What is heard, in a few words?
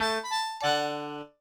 Stinger Good; SpongeBob vs The Big One Beach Party Cook-Off; NDS